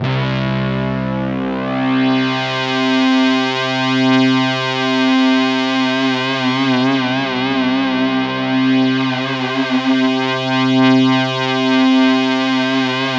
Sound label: C1, Roland JV 2080 TECHNO PAD